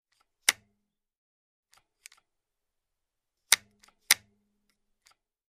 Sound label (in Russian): klacayut pereklyuchatel skorostej velosipeda